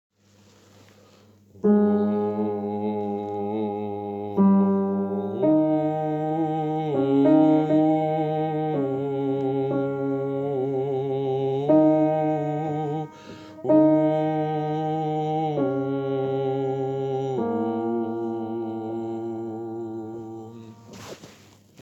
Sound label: Alto